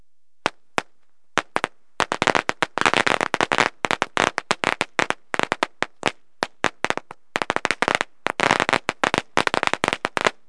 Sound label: Long String of Firecrackers; Household; Fireworks